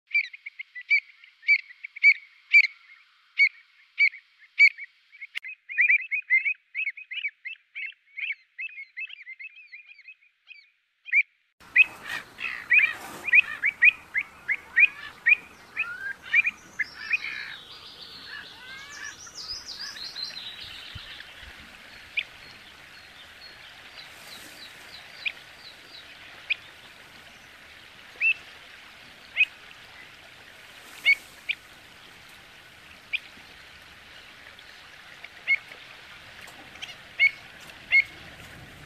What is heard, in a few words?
Pied avocet; نوک خنجری